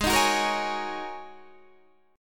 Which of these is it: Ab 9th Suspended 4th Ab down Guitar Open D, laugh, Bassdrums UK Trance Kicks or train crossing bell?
Ab 9th Suspended 4th Ab down Guitar Open D